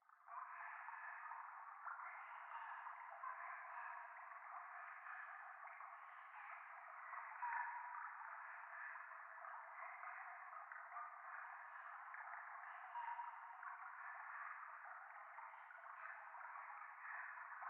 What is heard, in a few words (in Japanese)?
廃墟 右耳密着処女喘ぎ 川のせせらぎ